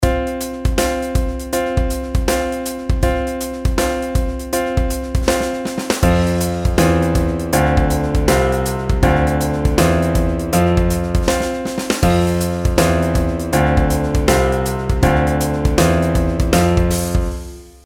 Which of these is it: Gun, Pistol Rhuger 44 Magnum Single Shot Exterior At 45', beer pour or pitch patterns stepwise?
pitch patterns stepwise